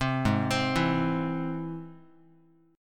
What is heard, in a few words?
Ab Augmented Ab Guitar; Ab+ arpeggiated; JGuitar